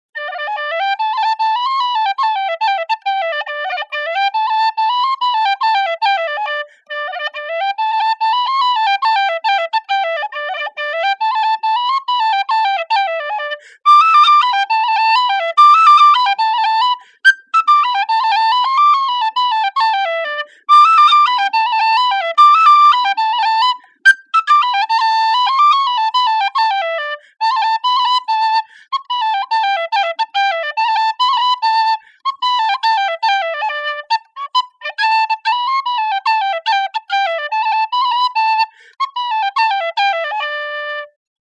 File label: feadog; blarney